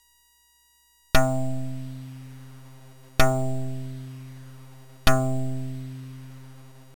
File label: string